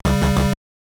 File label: Incorrect Answer; double dare nes